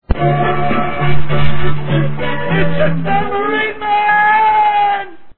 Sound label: tamb; TAMBOURINE